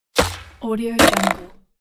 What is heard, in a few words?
skateboard trick and land